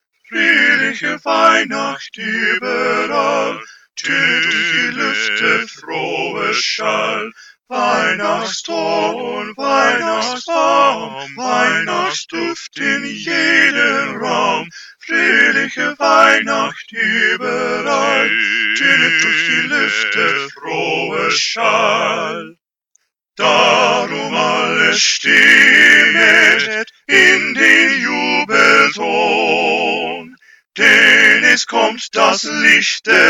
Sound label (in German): Tutti